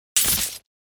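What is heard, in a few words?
KATANA SWORD normal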